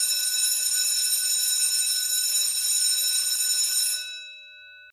Chime English; RPG Time: The Legend of Wright; Xbox Series X/S

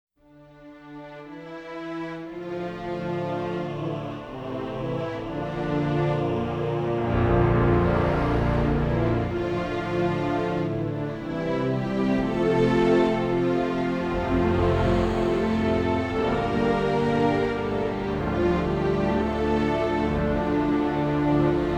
Rohn Theme–The Quest (Synth Demo); lair

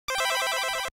Arcade Victory; WrestleQuest

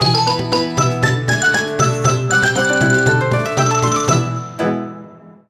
Quest Complete!; Yokai Watch Sangokushi

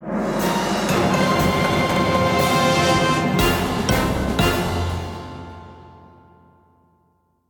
Swamp Save Fruit Ending; fat princess adventures

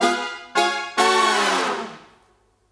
Victim Rescue

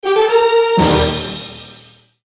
Crafting

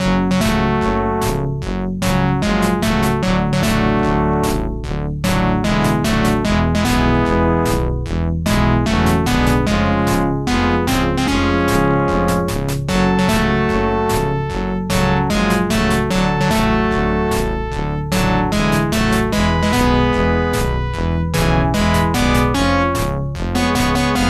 Ship Battle; 1943 The Battle of Midway

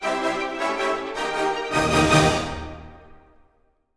Transition Fanfare

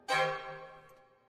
shock jingle light; Holy Potatoes! What the Hell

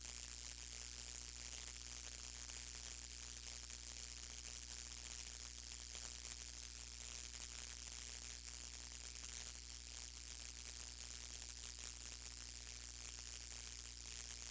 light buzz

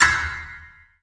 break piano keys; Stranglehold; PS3; Xbox 360

